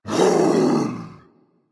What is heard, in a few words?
battlecry berserker; cuchulainn